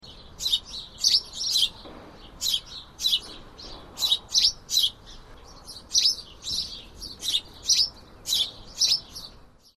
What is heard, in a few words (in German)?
haussperling